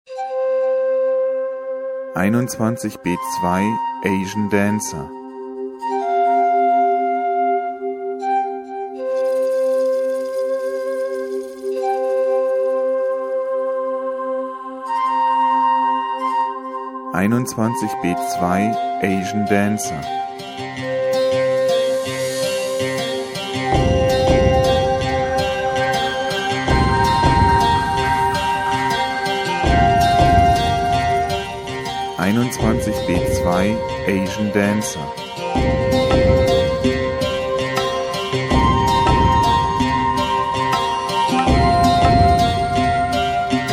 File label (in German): Asien Dancer